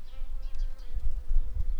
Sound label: Recording, bumblebees, Sylvarum workers